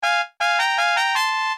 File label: fanfare